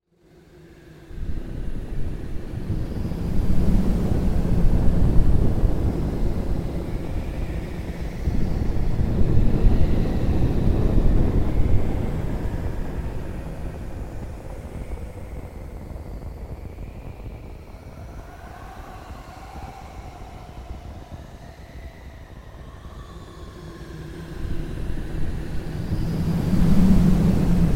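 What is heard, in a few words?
blizzard snowstorm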